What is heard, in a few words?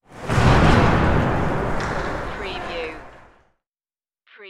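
Hockey Hit Glass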